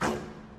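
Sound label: Archery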